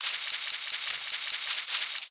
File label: Water super dash; Hollow Knight